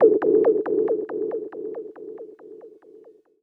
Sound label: KEY SHOT TEKNO 140 A; Techno2; Singleshots